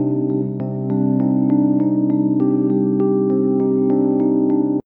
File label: electric piano